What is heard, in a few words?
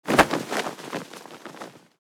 Barber cape placement whoosh movement